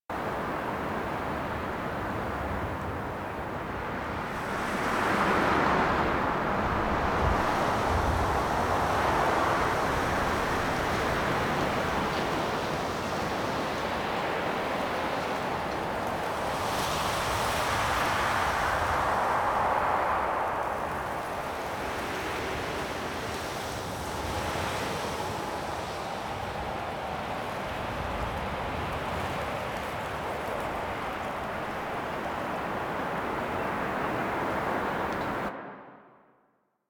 Exterior Background Amb Loop; Ambience; Warframe; Duviri

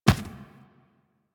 Infested Medium Run Default, footsteps; Warframe

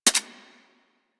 Braton Vandal Redux Fire Mech; Warframe; Weapons

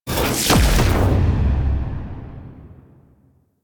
Railjack Warframe Power Cast; DE; Warframes

